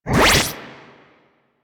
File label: Spectra Stop 2D, weapons